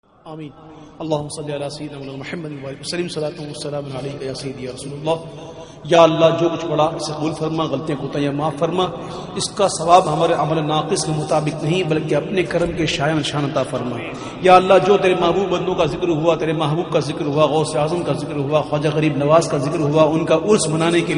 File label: Dua; Naats